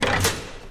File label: tardis, control handbrake; drmatt